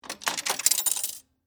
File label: Pay Phone Lever, Technology, Telephone, Coin, Release, Lever, Coin, Dispensed, Pay, Phone; BlastWave